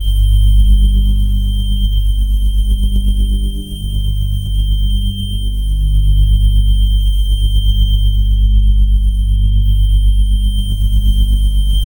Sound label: soft electronic pulses; glimmering